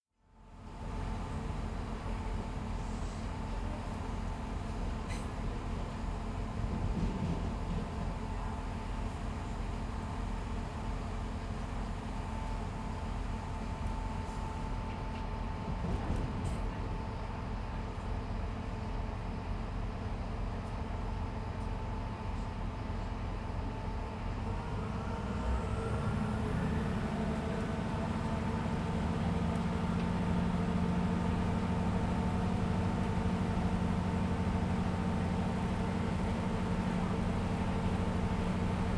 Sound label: Train Interior Start Stop; NoiseCreations; Aura